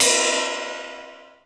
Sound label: RIDE, COMPU DRUMS; Crypton - DrumBase5000